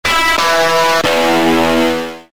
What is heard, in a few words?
Fuzz Guitar